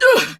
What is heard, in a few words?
Pain, Battle; Warframe; Dax; DaxRanged; en